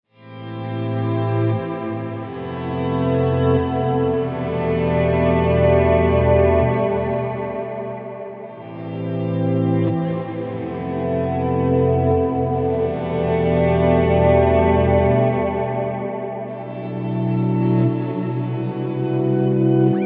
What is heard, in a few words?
swell; BigSky; reverb; Stratosphere